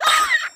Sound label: Scream, D#5; 音MAD; 孤独摇滚